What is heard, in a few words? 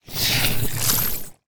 Boss Mech Turtle main, monster